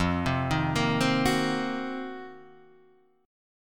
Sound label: C Minor 7th Flat 5th F, chord; Cm7b5/F arpeggiated; JGuitar